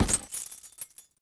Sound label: chandelier Hit; objects; Star Wars Obi-Wan; XBOX